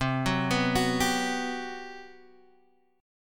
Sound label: G Major 7th, C; arpeggiated; JGuitar